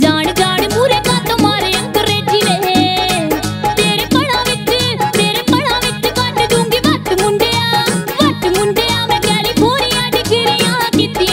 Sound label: Love Punjabi Ringtone